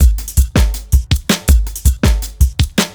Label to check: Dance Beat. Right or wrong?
Right